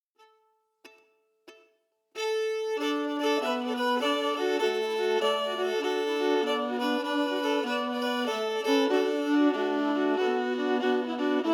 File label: harmonies emphasized; Munster Buttermilk